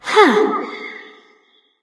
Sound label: confused light; smite; voicepack; hel